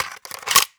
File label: Load Cassette